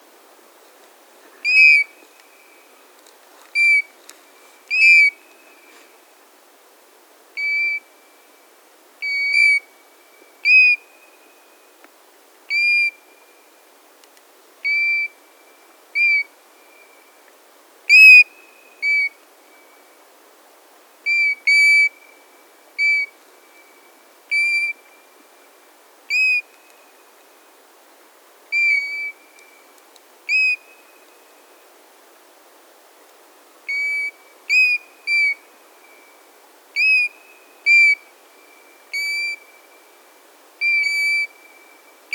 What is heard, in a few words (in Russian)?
zolotistaya rzhanka golos